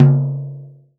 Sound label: J T 2 MF, TOM JAZ